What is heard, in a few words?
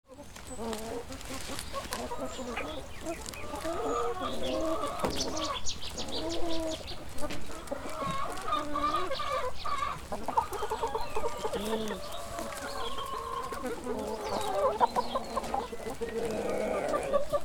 Chickens eating grass